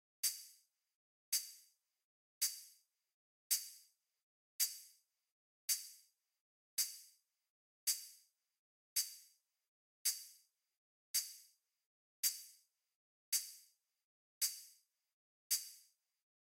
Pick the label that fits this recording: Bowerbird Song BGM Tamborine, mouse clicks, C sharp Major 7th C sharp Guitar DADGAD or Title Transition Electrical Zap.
Bowerbird Song BGM Tamborine